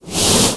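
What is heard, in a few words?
flame start; freedoom; catoptromancy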